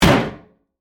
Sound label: Impact Metal Drum, Hit; Crashes; BlastWave